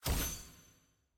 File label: ui button play click; dragon